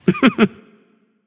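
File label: "heh heh", nonverbal; smite; voicepack; khepri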